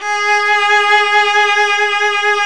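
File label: G#4 VIB. violin; Northstar - New Gold VOL-2; ORCH.COMP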